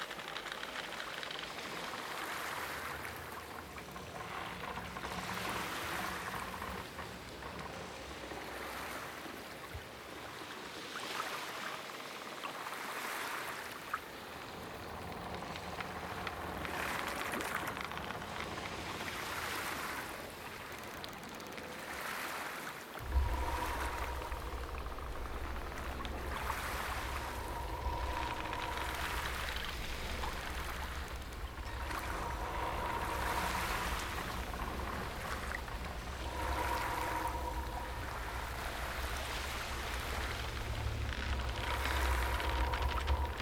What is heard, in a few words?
ruined port; michael ghelfi